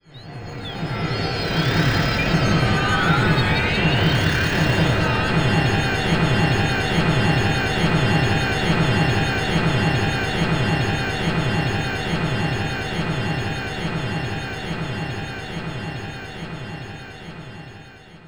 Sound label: Enemy Rose Queen Active Attack Loop